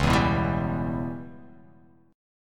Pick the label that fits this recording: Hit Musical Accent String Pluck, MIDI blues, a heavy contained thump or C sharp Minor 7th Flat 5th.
C sharp Minor 7th Flat 5th